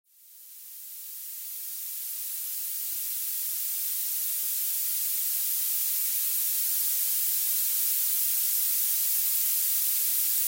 violet noise rumore violetto

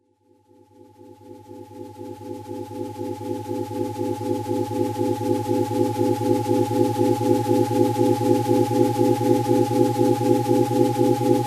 serotonin asmr

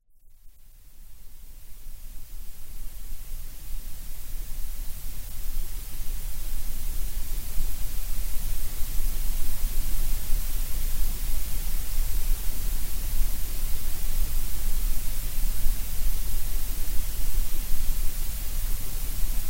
gray noise rumore grigio

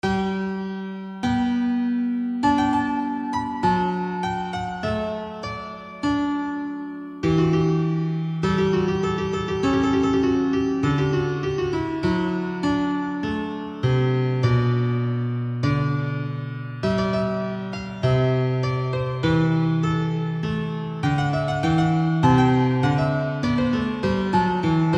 Bach Goldberg 988 aria 432hz

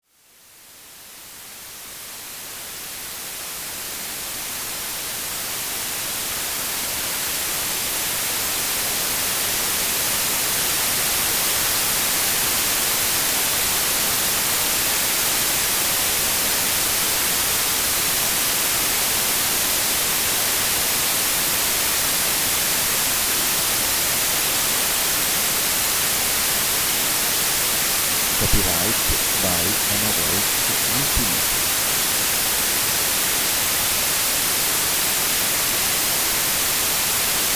white noise rumore bianco

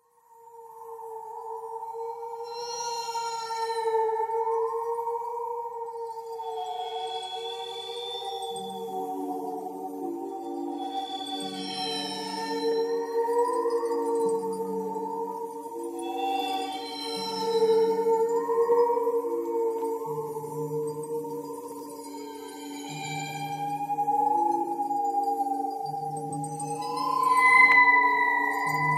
waterphone

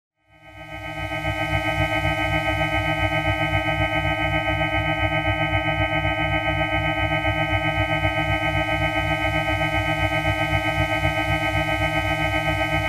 psoriasis rife

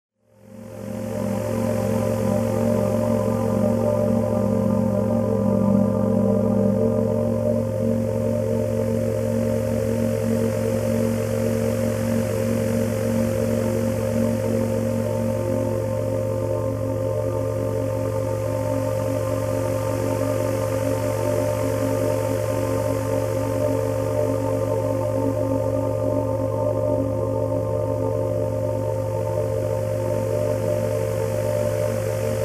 gamma isochronic meditation

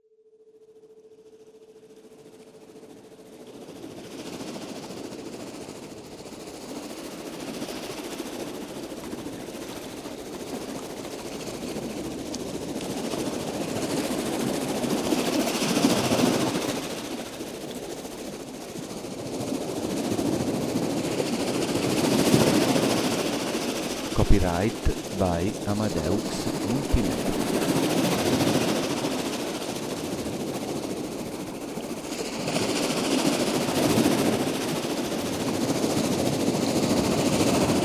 smoke calm sea

